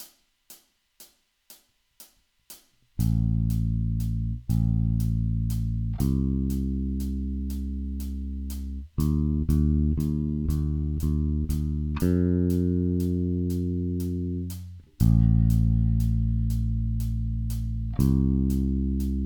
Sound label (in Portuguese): triades, baixo